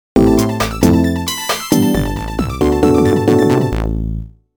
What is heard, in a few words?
Theatre (Jingle); thats so raven psychic on the scene